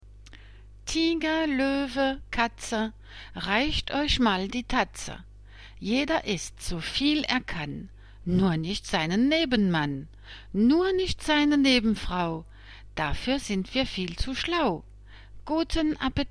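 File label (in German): Tiger Löwe Katze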